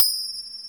wet ride d sharp key, Royality free ride cymbal single hit tuned to the D# note